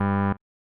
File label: Chance Game Incorrect; pro mahjong kiwame 2; snes